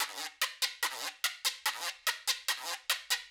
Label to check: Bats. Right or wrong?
Wrong